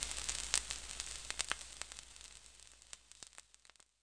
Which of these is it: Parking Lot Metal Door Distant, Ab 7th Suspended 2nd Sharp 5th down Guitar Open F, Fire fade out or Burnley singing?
Fire fade out